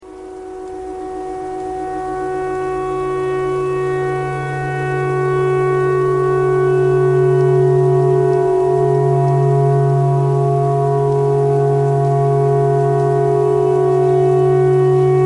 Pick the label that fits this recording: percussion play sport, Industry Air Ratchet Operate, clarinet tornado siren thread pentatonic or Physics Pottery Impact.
clarinet tornado siren thread pentatonic